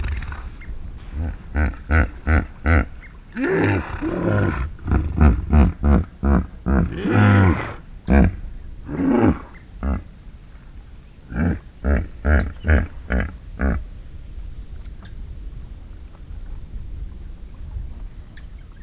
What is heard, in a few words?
Hippo several vocalizations example, Mozambique